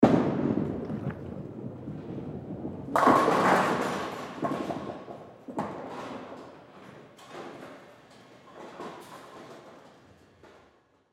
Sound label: bowling ball all pins